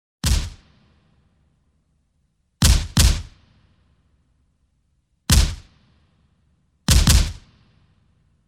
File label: machine gun shots ringtone; android